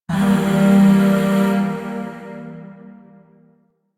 Zariman Void Shawzin Phrygian Chord

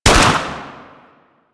Heavy Space Weapon C; Warframe

